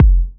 Kick, Kickdrum; Equipped Music; Loungin House

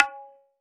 Tabla Baya Na; Roland BK-5; Percussion

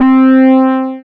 SYN ANALO, dance; Sound & Vision - Gigapack II

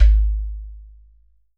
GHATAM

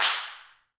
Handclaps; Roland LCDP14 Africa VOL-2; Afr. Hands

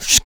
HIT, Jazz FX FEM PERC

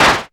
Axxe HC, ARP Axxe Drum Machines

